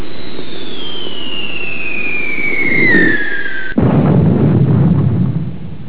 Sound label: mortar